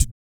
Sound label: BIG BREATH